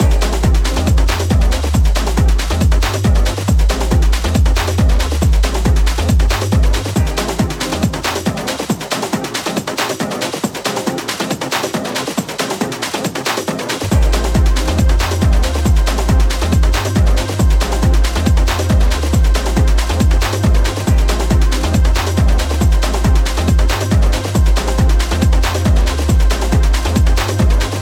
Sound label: Hardgroove Snippet